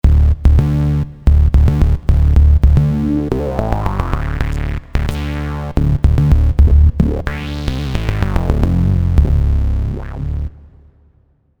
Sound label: Low Booster; V-Synth